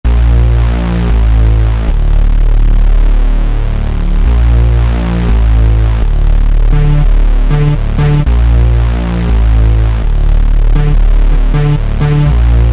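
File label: Unison Saw Bass; Roland SRX-05 Supreme Dance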